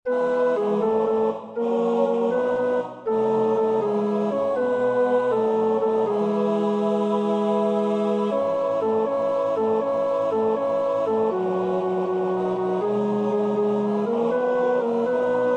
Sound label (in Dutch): tenor 2, koorpartij oefening; Schubert (Franz); Vier Gesänge für vier Männerstimmen, Op. 17